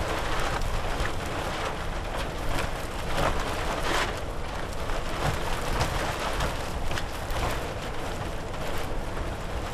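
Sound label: tarp movement plastic close; Titanfall 2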